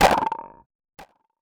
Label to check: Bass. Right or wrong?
Wrong